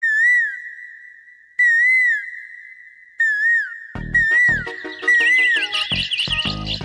Sms Koyal

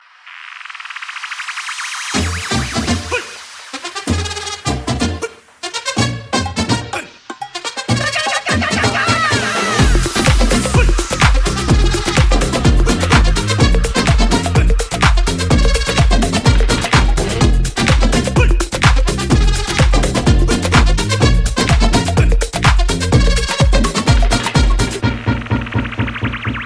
Salsa dance

